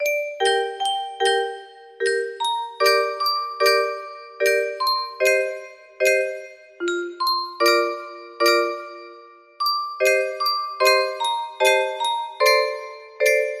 melody

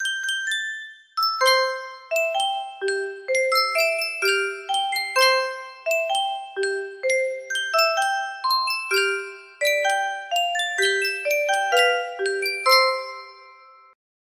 Sankyo music box, music box melody

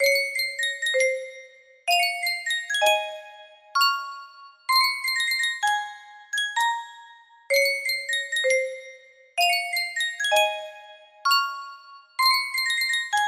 sankyo custom tune music box

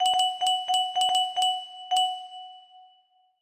super mario jingle, music box melody

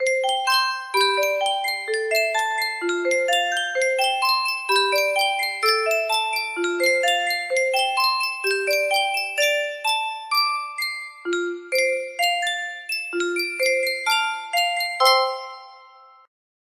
sankyo music box, music box melody

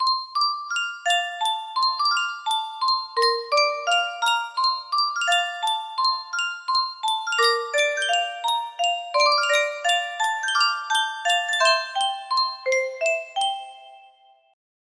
yunsheng music box

